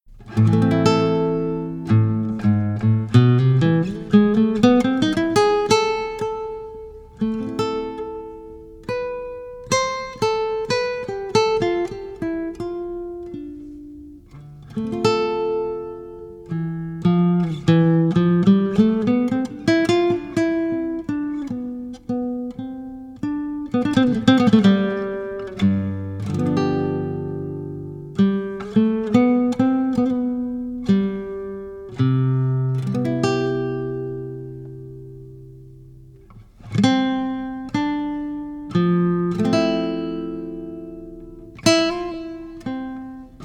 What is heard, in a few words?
Guitar Interlude